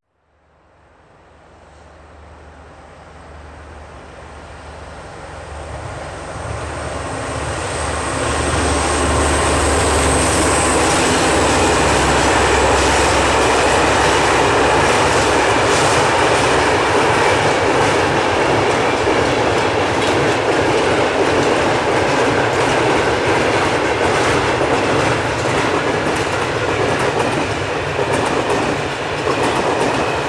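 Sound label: train sounds; zvuki poezda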